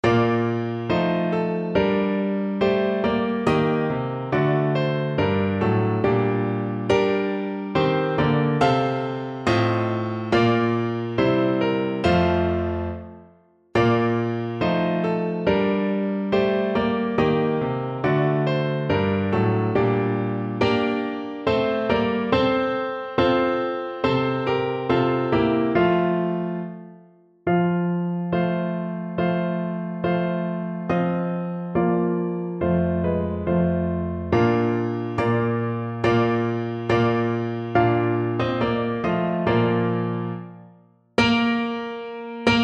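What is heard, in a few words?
serbian national, piano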